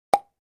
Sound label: Pop Up Hollow Pop, pop up element